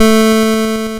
NOTE G3, LSDJ; LIMAT LSDJ kit; LSDJ Notes; Drums

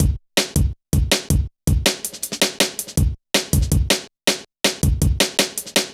Kicker, Jungle, Drum Loops 161.5; Zero-G Jungle Warfare 1, 2 & 3

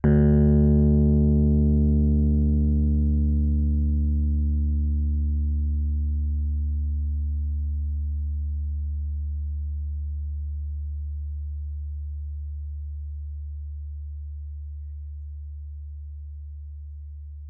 D2, Rhodes Mark II; mkII 88 key MID; CEPCo Sample Series Vol. 3

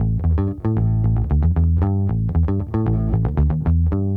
Disco Bass, 70s Analog Funk; Sonic Foundry (Sony Creative Software); What it Is! 70s Analog Funk

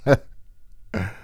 LAUGH, Male Vox; E-MU Formula 4000 Series Vol. 1; Hip Hop Nation

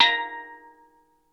STL. DRUM A#3, TONAL PERCS; Crypton - DrumBase5000

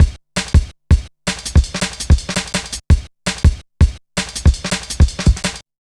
Cymbal Rise, Drum Loops 165.5; Zero-G Jungle Warfare 1, 2 & 3

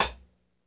LOFI HAT; LO-FI KITS; Spectrasonics - Burning Grooves CD2

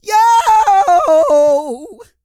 YELL, MALE HUMAN; Spectrasonics Vocal Planet

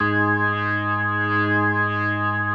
G#2S, B3, Vintage Keyboards

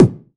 BD, BASS DRUMS; EdgeSounds

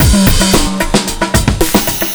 Mondo Bongo 112 bpm, breaks; Zero-G Beneath the Planet of the Breaks

